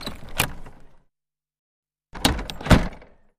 Carriage Door, carriages

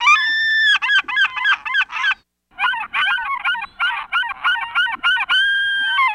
Seagull Herring Soar

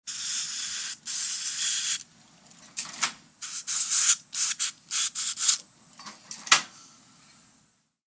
Dental Air Blower, dentist

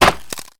Bullet Hits Wood Ratt

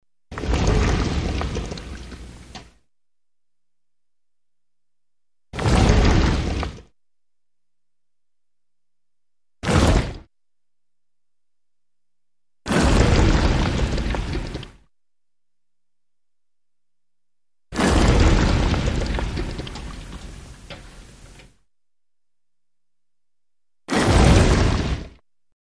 Dirt Debris Falling, rock & sand

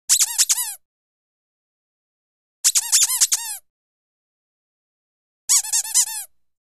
Rubber Squeaks High

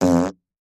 Dry Fart

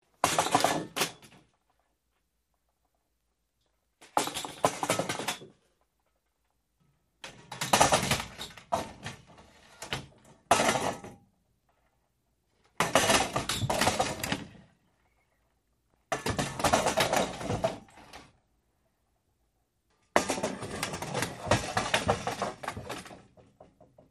Chaise Lounge Crash A; seats & stools

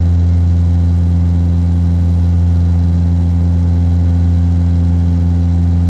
Air Express Int; Air Express Int Loop

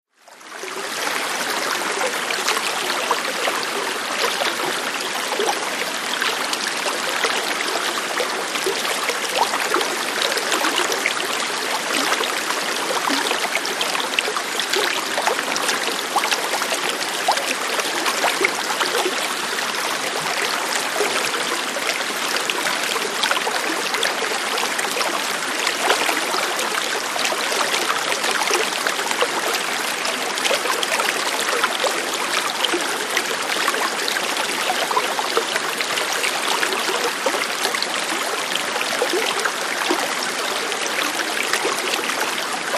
River Smooth Flow CU R

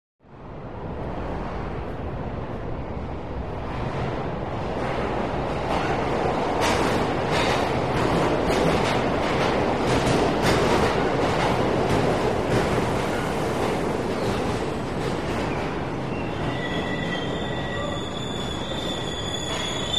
Subway Platform Trai, subway

